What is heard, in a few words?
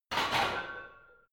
barbell heavy plates replace rack reverby hard; sport freizeit; fitnessstudio